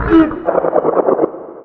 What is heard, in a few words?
strider hunt; npc; Half Life 2; Episode 1